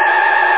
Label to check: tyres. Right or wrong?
Right